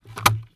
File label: Item Placed